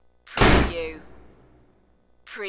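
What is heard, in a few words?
Small Metal Door Slams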